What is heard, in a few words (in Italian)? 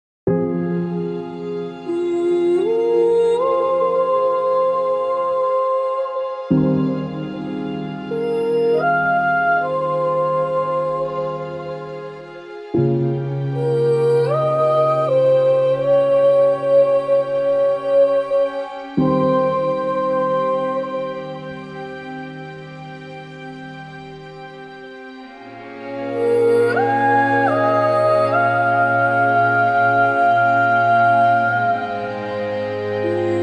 Non E Come Noi voice version